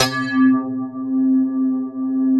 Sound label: RATTLE BASS; Greytsounds; Scott Peers Sound Engineering